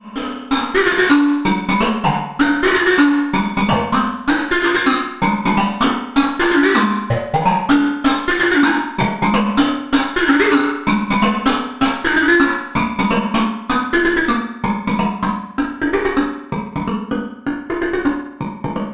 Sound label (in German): Korg volca modular Woggle im WC